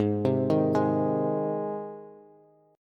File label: G sharp Major G sharp Bass, chord; arpeggiated; JGuitar